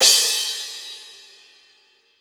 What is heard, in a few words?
crash cymbal single hit B key; cymbal drum samples